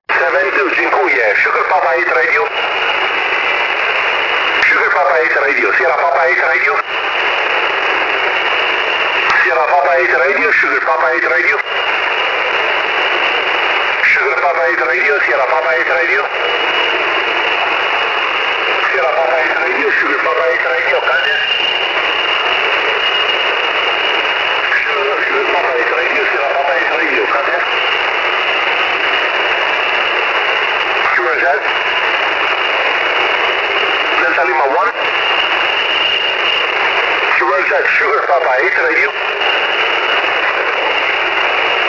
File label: ham working